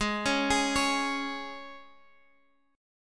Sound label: Db 5th Ab